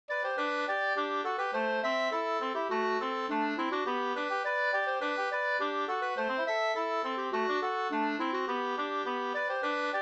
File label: polka, polketten; Upplandspolketten Flen28 2st